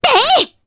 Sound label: Vox Attack, jp; voices; heroes; Mirianne; kingsraid